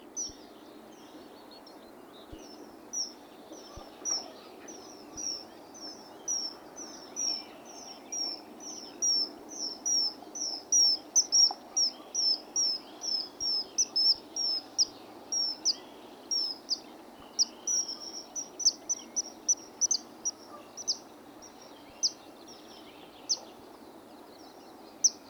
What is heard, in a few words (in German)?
eisvogel; karl-heinz frommolt; deutsches tierstimmenarchiv